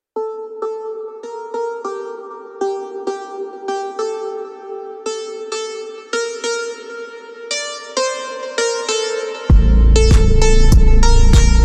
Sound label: Funk